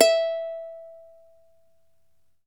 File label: uke E4